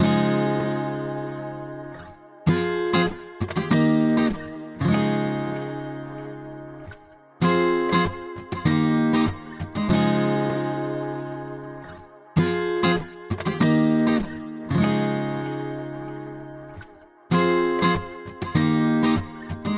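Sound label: 117 Fm LIVE acoustic guitar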